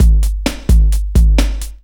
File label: drt 130 electro, DRUMLOOPS, LOOP ELECTRO